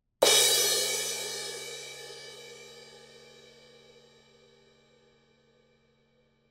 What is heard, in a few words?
ZILDJIAN 19 PROJECT 391 CRASH